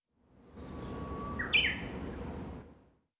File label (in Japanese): uguisu